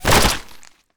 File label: flesh; combat; weapons; mgun